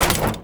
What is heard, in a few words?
Metal Ladder Hit